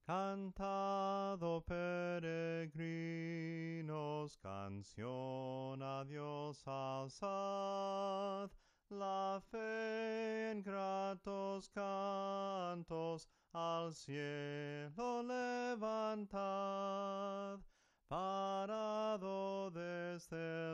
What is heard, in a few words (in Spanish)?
CANTAD ¡OH PEREGRINOS SOPRANO CANTADO